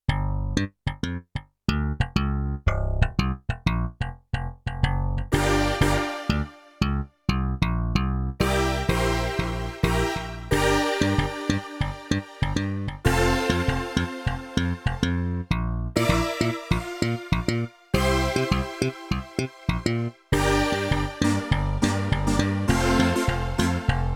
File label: Slapbass-Synth Split, Yamaha TX16W; Combo Performance 1